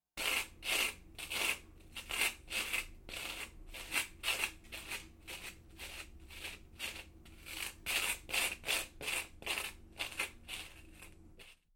Coconut Peeling

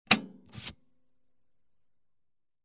Floppy Disc Eject fast

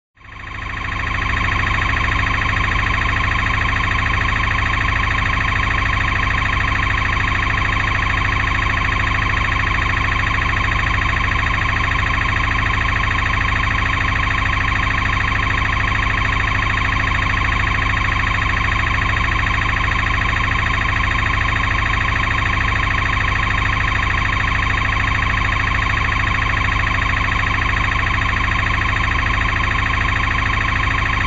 Industrial Machine Engine running

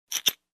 Tightening a screw with washer

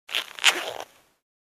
Clothe Zipper

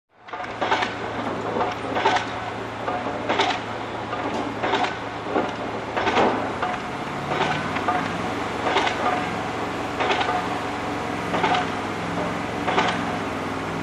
Concrete Mixer with Constructions Site Ambience

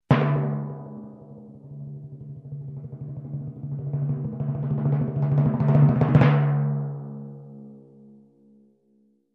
Long Timpani crescendo

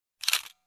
DSLR Camera Shutter Sound

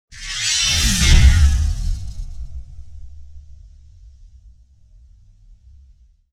Grinding blades and swing

